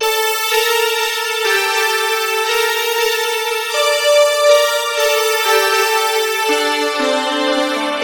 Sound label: xtra lead (ghost); Sonic Foundry (Sony Creative Software); Ugly Remnants VOL-2